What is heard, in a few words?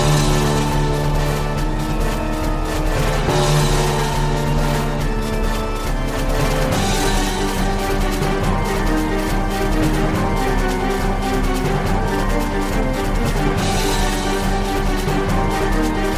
Tension Rising